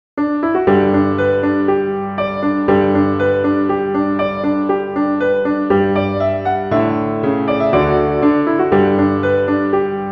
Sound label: Branle de village